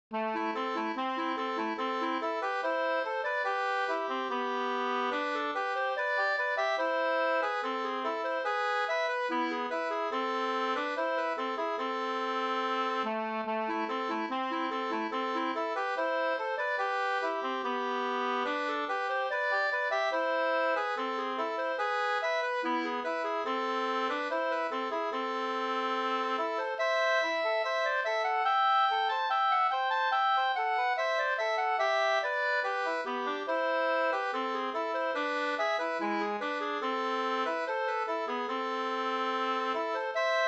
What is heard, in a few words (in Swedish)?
brudmarsch; Marstalla-Olles brudmarsch e August Bohlin